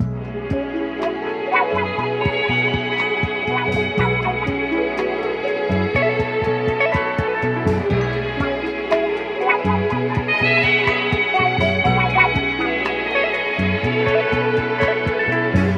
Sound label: strings smooth and laid back, loops, phonky